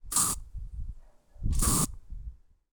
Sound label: spray can raw